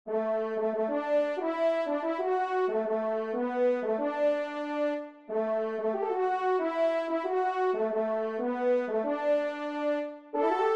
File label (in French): trompe; Comin Thro The Rye; En Traversant les Seigles; Robert Burns ((1759-1796); 1e Trompe